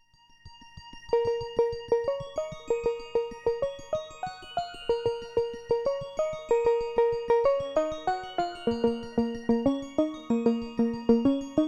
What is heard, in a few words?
3 VCOs PWM; GRP A4